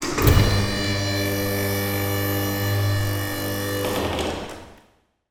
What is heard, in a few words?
industrial machine electronic door open; sound effects one